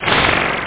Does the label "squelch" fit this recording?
Yes